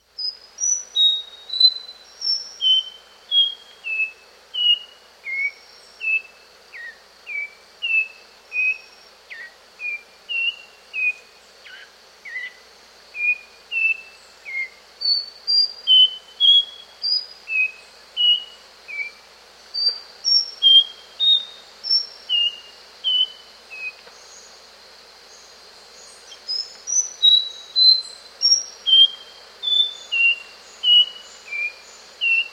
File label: Nightingale Wren; S. America